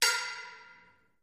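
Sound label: Impacts Metallic Echo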